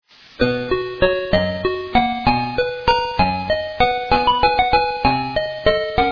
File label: Waltz